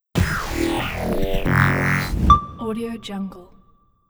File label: futuristic animation logo reveal short